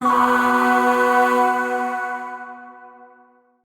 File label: Void Shawzin Chromatic Chord; Warframe; Zariman Void Shawzin